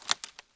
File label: twigs; The Sum of All Fears